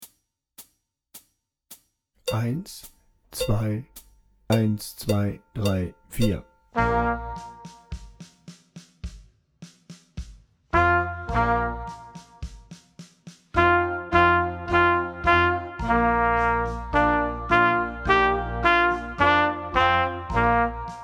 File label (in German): Lets Rock Trompete lauter langsamer